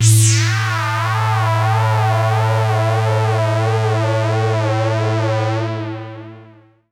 Noise Drone A, Ambient; Trance Formation

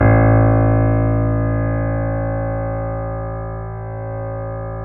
BOSENDORF

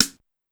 Rim, Drum Machines, Clavia DDrum AT, Pitched Up; 300 Drum Machines

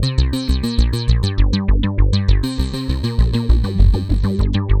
FASTFOOT 199, MIXED HOOKS; Masterbits - Tekkno Trax

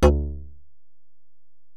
Bell, Alesis DM-PRO

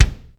Thumb Kick, Ensoniq ASR-X Pro

